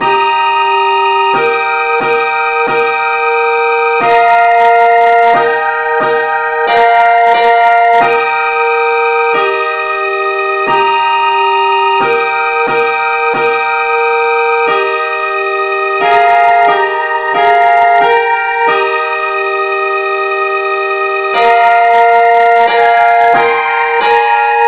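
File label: no vocal key e flat; henley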